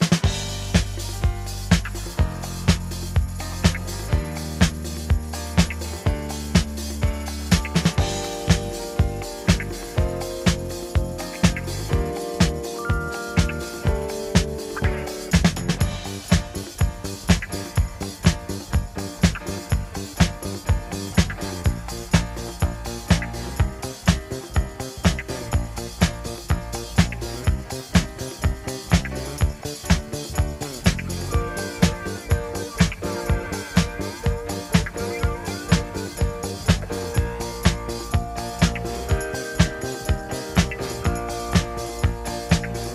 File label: Base Music Loop